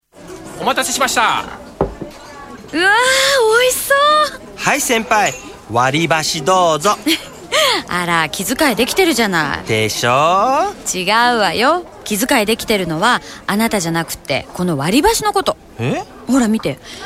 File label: radio sound